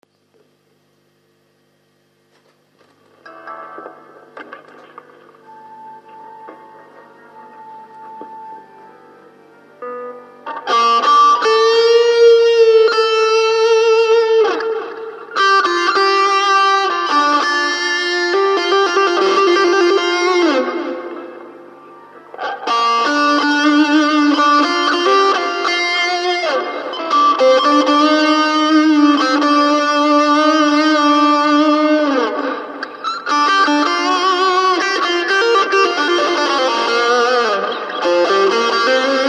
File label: Guit